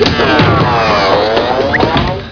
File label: Critical Stop